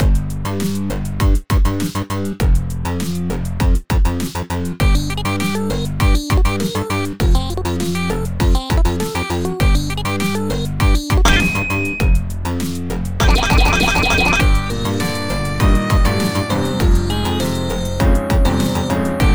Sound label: Fight; Friday Night Funkin' vs. Stickman